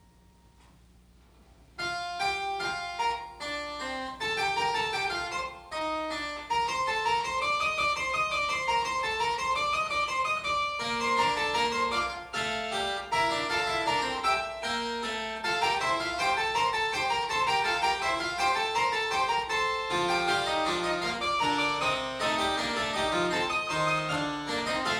Well Tempered Clavier Prelude in Fugue No.21 in B flat major; JS Bach